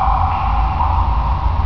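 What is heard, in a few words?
sewer drip; TNPDEMO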